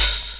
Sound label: open hihat; Techno Trance Essentials; DRUMS